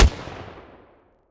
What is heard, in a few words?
hand Cannon; WEAPONS; Captain